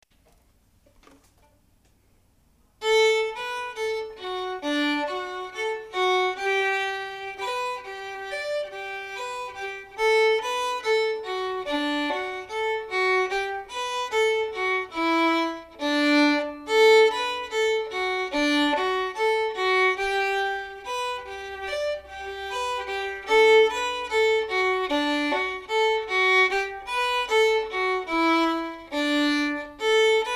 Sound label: slow